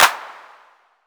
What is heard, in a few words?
Clap; Vengeance Samples